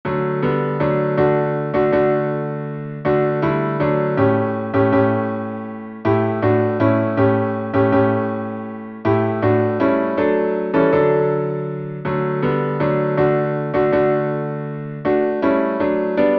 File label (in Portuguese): salmo instrumental